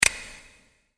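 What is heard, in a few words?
pre attack shot; kawitto banditto; kiwito bandito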